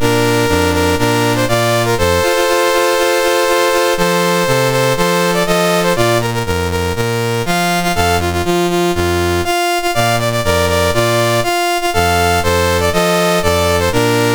MOS6581R4, National Anthems; Oman

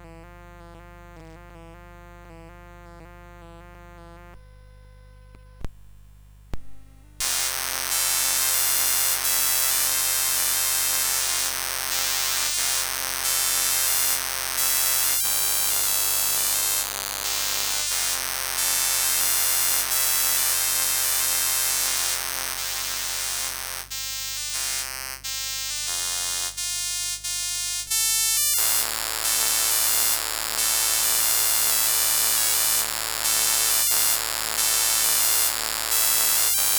Scotch Bagpiper, CSG8580R5

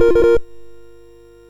sid, MOS6581R2; Donkey Kong 1983

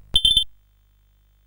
sid CSG8580R5; Pick n Pile

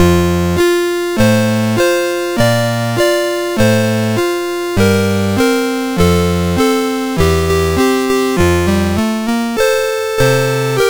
Alphabet Song, MOS6581R2

